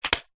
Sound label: box card small safety pins lid off set down; household